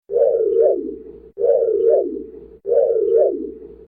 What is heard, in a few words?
lock chime; car locking